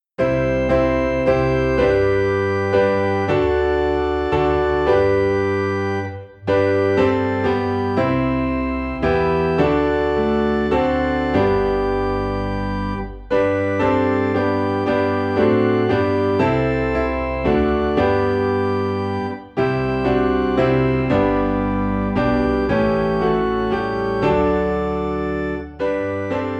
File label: Piano and Organ in G; Faith of Our Fathers